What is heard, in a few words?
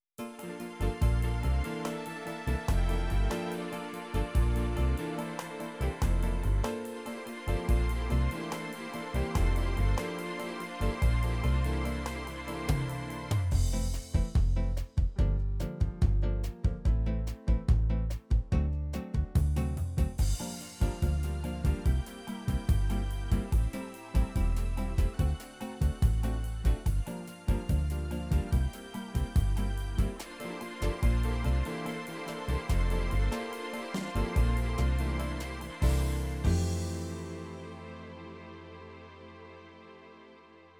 Brazilian Bossa